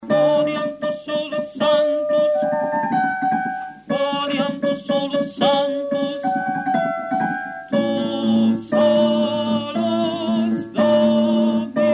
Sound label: Vivaldi Gloria Quoniam Tu solus Sanctus Soprani